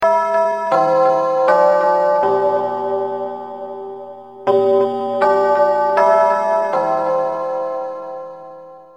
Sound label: chime, electronic, school